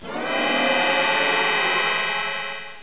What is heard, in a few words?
Teleport